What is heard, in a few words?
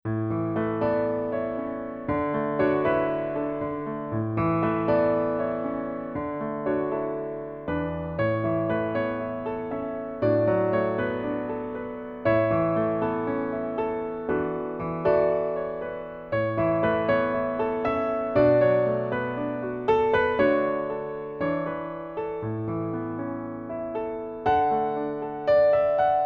accomp